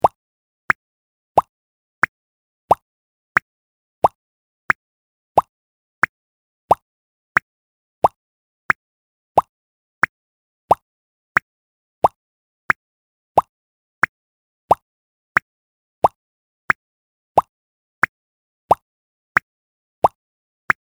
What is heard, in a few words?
anime walk